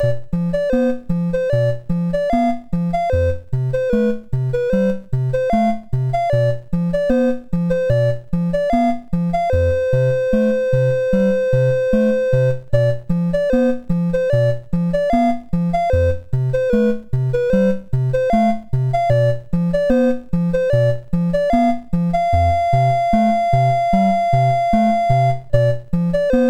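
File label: Magicians Ball, MOS 6581 SID chip music, games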